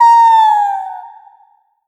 blues queen death; Crypt of the NecroDancer

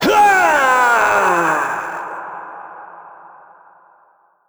death; Crypt of the NecroDancer

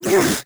teleport; Crypt of the NecroDancer

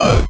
mole death; Crypt of the NecroDancer

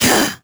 melee; Crypt of the NecroDancer

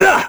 melee; Crypt of the NecroDancer

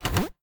crossbow reload; Crypt of the NecroDancer

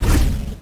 elem fire hit; Crypt of the NecroDancer

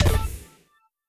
ui back; Crypt of the NecroDancer

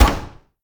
door metal close; Crypt of the NecroDancer

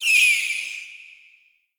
wraith cry; Crypt of the NecroDancer

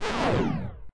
chain break; Crypt of the NecroDancer

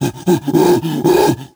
king conga cry; Crypt of the NecroDancer

